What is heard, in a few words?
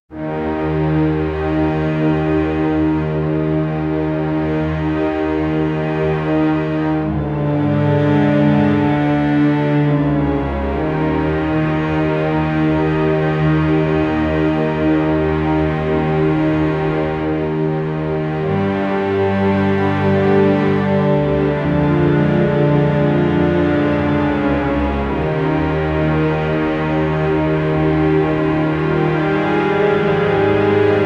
melancholic orchestral; noble